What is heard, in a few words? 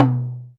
DM5 Perc, Alesis DM5 drum machine